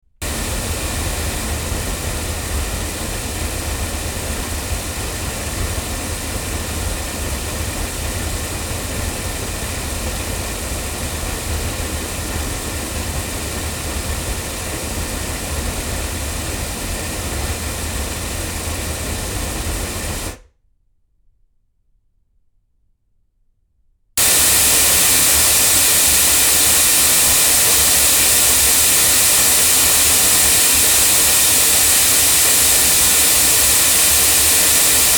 Oktava MK319A loudspeakers

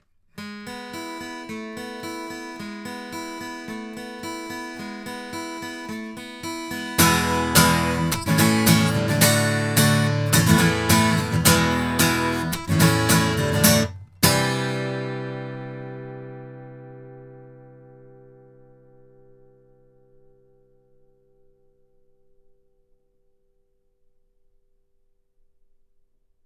sE Z5600a II cardioid guitar, microphones; se electronics

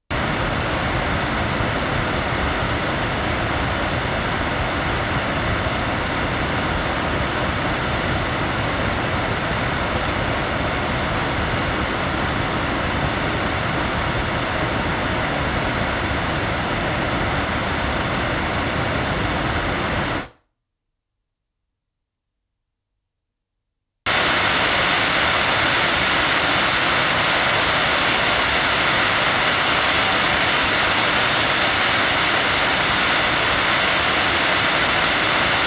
Royer R-101 front loudspeakers

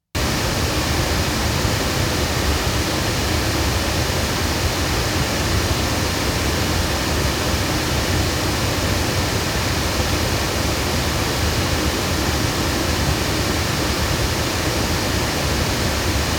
loudspeakers; CT Proscenium; microphones; Crowley Tripp

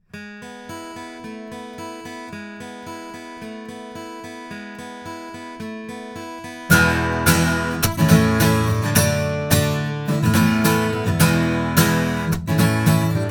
Manley Reference cardioid guitar, microphones

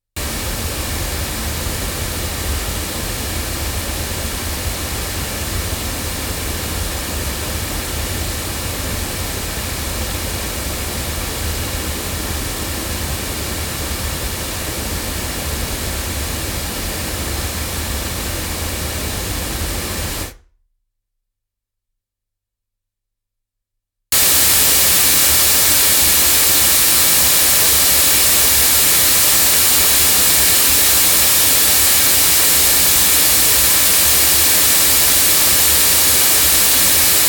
Golden Age FC3 cardioid loudspeakers

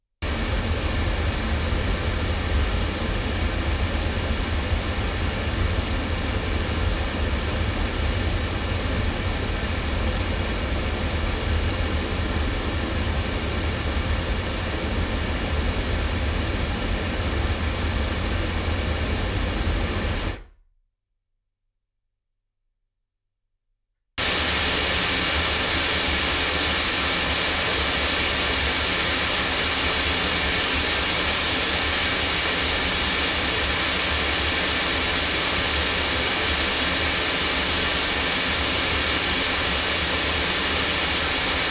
omni loudspeakers; GoldenAge FC3; microphones

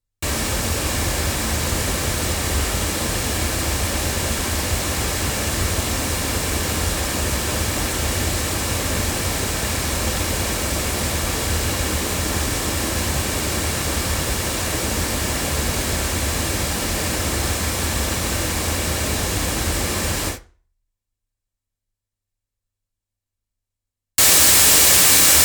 loudspeakers; Pearl CC22; microphones

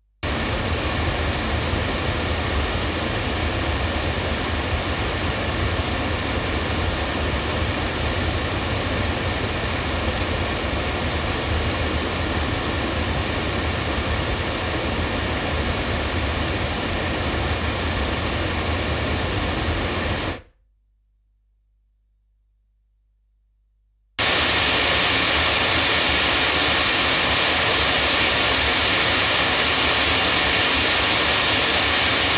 BeyerDynamic MCE90 loudspeakers, microphones

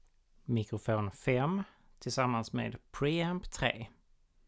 mic pre

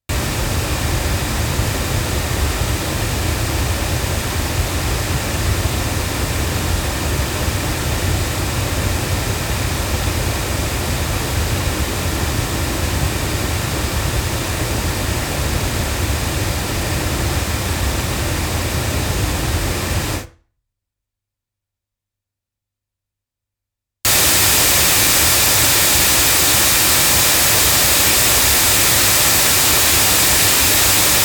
loudspeakers; GoldenAge R1; microphones

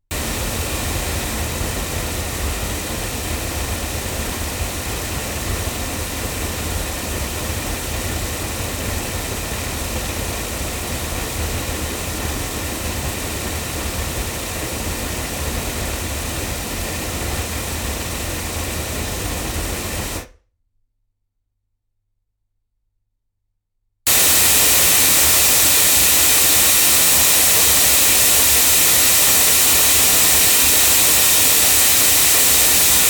loudspeakers

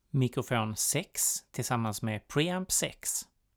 mic pre

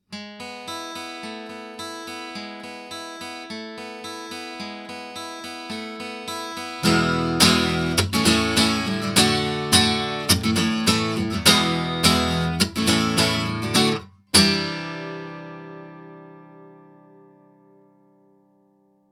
guitar Gibson, microphones, Milab D-37

